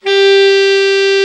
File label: Alto Sax; Sound & Vision - Gigapack II CD 1